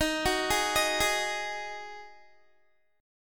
D sharp Minor Double Flat 5th D sharp, down, Guitar; arpeggiated; 4F,4A,5C,5G,5C,6E; chord